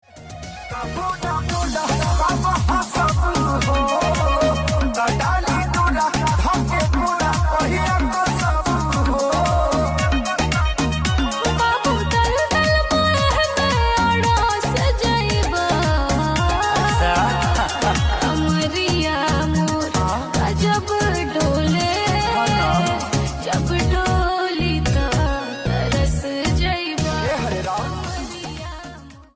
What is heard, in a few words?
Ringtone